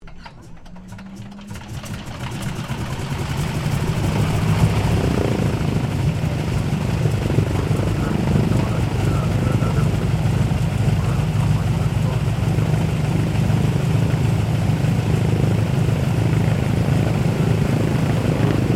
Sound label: Aircraft At-6D Harvard Mk Iib 1942 Startup Taxi Stereo, training aircraft; Airplanes